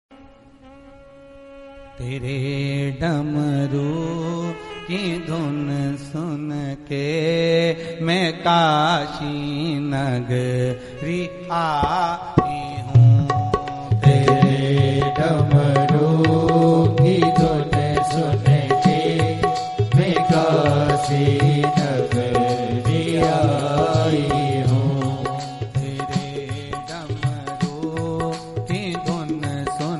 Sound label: shiv bhajan